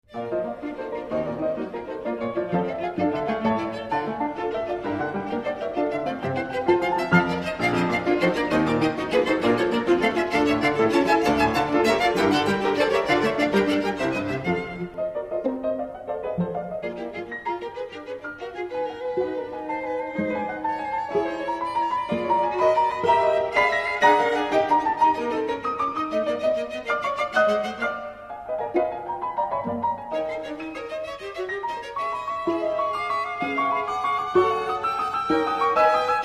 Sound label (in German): klavierquartett